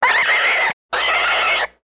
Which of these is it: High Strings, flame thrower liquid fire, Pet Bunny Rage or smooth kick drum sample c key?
Pet Bunny Rage